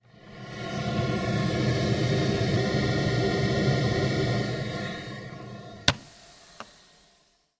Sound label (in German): Rohrpost